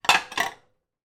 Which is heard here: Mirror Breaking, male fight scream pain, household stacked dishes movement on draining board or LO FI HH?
household stacked dishes movement on draining board